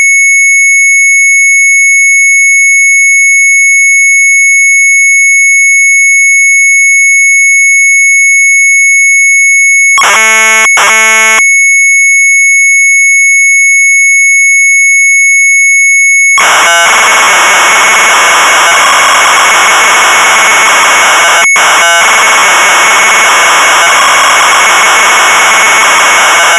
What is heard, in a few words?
MZ800 Tape; BLOEMFIG.; retromelsarchive; sharp mz800